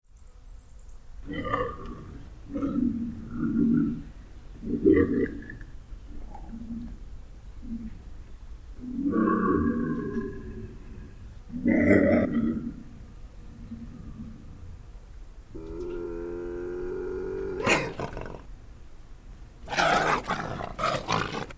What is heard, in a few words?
bobcat